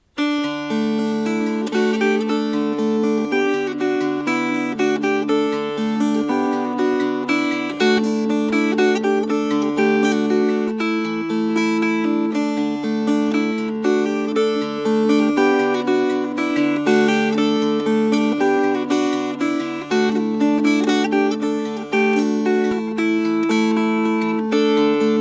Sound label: Fingerpicked, Dulcimer